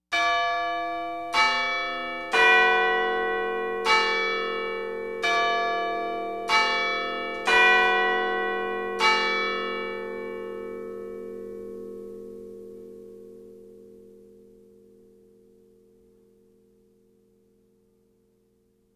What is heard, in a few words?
Clocks Tower